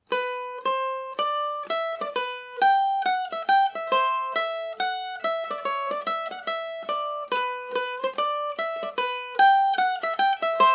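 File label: barndance G major, Irish mandolin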